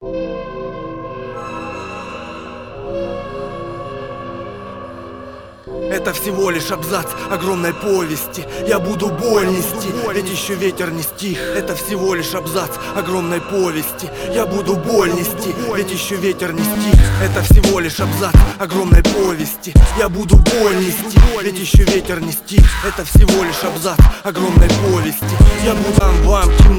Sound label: Dubstep mix